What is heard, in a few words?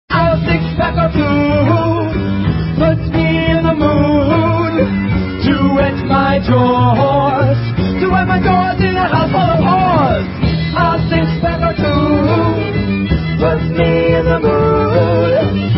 lofi; broadway; bobbing